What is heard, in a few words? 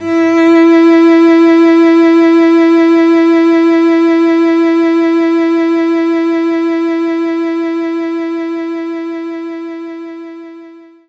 Cello E3; knights try